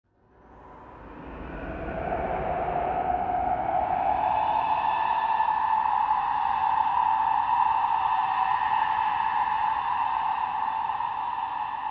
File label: Siren; ambience